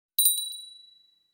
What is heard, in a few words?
Small silver steel handbell ringing